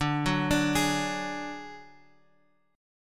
D Major Flat 5th D Guitar Open F, chord; arpeggiated; JGuitar

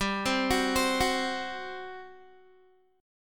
G Major 7th Suspended 4th, Guitar; GM7sus4; arpeggiated; 15,15,16,17,18,x

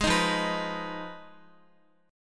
F Minor 7th Flat 5th, Guitar; Fm7b5; strummed

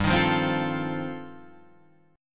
F 7th G, F7/G chord; F7/G strummed

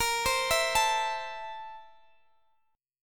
A Minor Bb, guitar; Am/Bb; arpeggiated

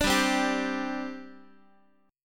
G Suspended 4th, Guitar; Gsus4 strummed; x,x,5,5,4,6

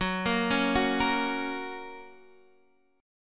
F sharp Major, Guitar Standard; arpeggiated; JGuitar

